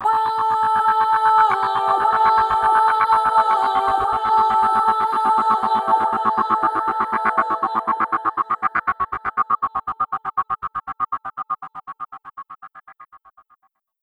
Gate Compression; Sonic Foundry (Sony Creative Software); Pocket Diva World Class Vocal Samples

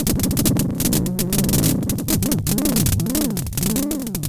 FX, Drum Machines; Fricke Schlagzwerg

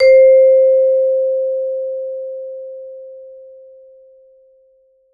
C4, Vibes; Sampleheads - Dave Samuels Marimba & Vibes

